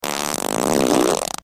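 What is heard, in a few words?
big fart close-up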